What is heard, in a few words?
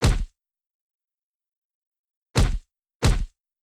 hit; betty